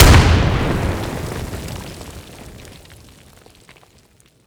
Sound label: grenade Detonate; Weapon Package FortWars